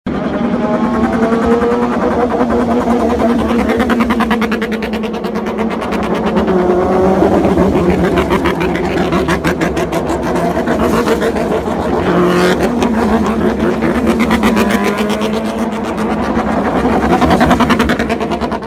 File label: Racing Sound